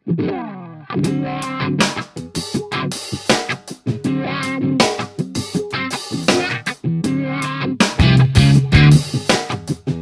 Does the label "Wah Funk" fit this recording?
Yes